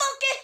Funky, Yamaha PTX8

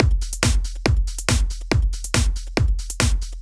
Kick Loop, 140bpm; Zero-G Trance Inducer; Drum Loops 140bpm; 90 s Sample CDs

